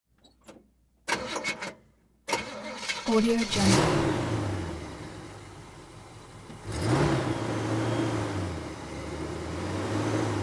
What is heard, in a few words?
chevy malibu convertible car